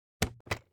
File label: Toy Toy Button Press Switch